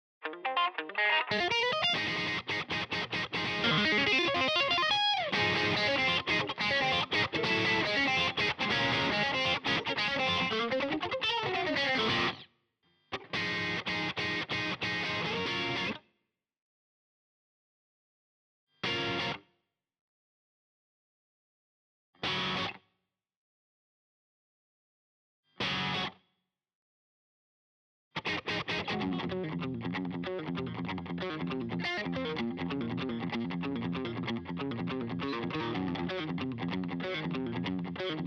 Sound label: Lead EG STEM; NCS オーディション楽曲